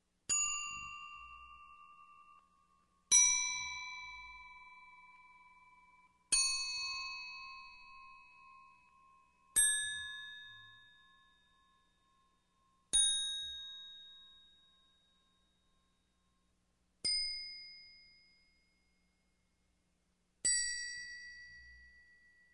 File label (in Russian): Odinochnye kolokolcgiki v raznuh tonalnostjah; Одиночные колокольчики в разных тональностях; Любой звук колокольчика, корабельного, музыкального, маленького, большого, одиночные удары